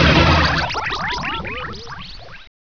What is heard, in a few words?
rustbot turret end